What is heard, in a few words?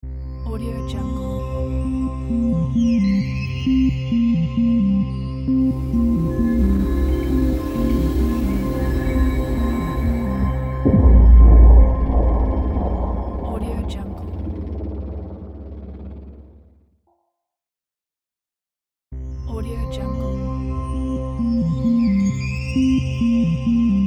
abstract elegance tech logo; spillane